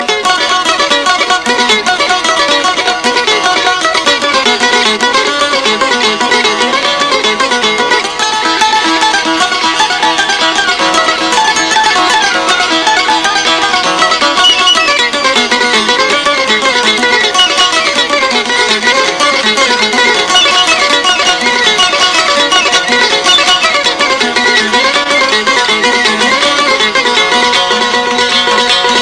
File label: Albanian tone